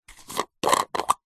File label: food vegemite plastic jar lid remove